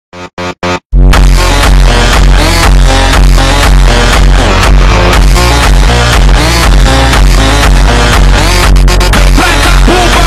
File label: hiit slowed